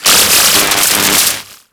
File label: Ocean Electric Surge Large; Warframe; Grineer Ocean